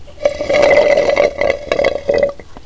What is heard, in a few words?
Slurpping Glass